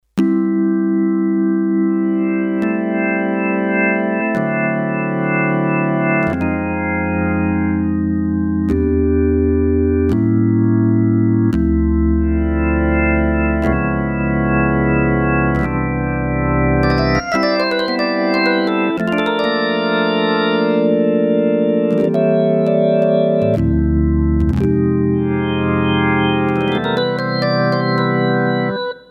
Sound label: Drawbs, M-Audio Venom